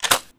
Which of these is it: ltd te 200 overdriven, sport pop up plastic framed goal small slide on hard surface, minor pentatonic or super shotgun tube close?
super shotgun tube close